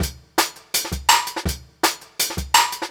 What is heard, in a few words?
165, Jungle Breaks; 90s Sample CDs; Original Jungle Breaks